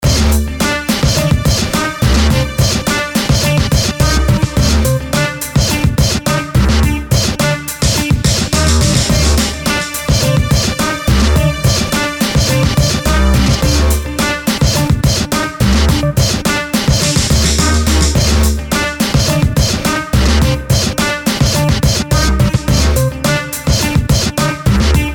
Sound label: drums